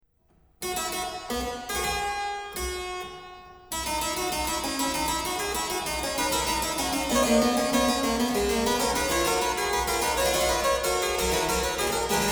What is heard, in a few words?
Fugue In C; The Well-Tempered Clavier; BWV 870